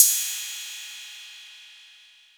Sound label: Drums Cymbal One Shot